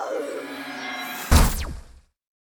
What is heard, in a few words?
enemy twisted Sister death